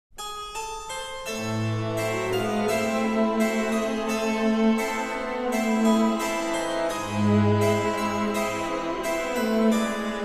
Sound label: For Harpsichord And Chamber Orchestra